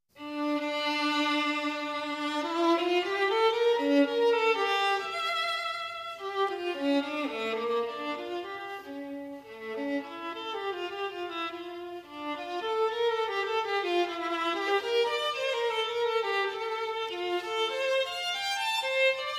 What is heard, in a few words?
violin